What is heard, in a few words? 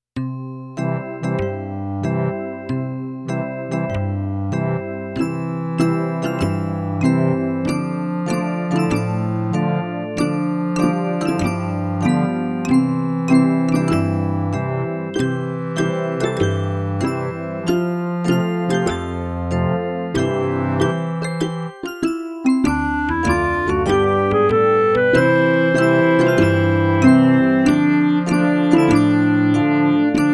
melody